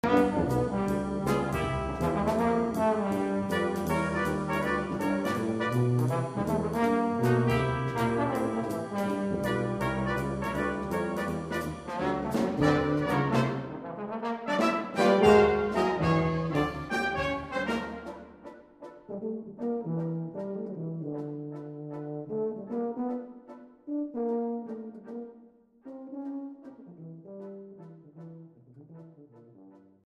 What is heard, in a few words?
brass blues